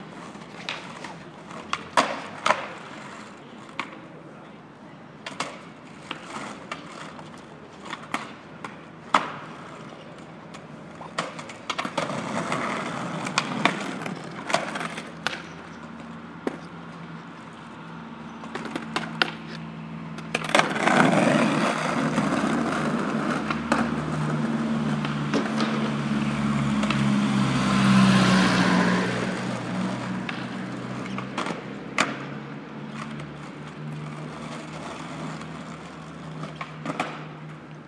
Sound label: kickflip to bs 50 50 failed